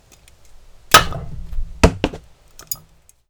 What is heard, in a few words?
Ca Chock tinkling metal ba dump Chopping Block combo